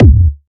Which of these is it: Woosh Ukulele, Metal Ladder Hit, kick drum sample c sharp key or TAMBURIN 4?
kick drum sample c sharp key